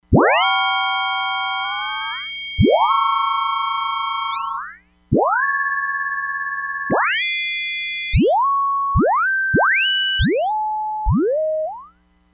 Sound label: OSCILLATIONS, Crumar Bit 99; Factory Programs